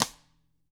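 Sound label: DW stick; ILIO; Double Platinum Drums 2; DW SNR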